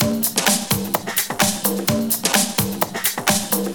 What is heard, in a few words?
Minimal Loops